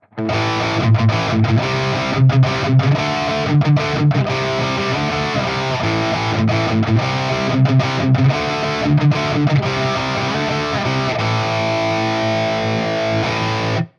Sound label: High Gain Revv Generator